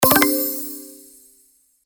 Game Positive

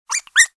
rabbit squeaking

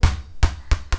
ball bouncing falling

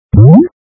going down

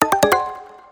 Negative Alert

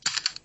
Egg Counter on Tray

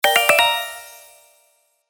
Level Complete

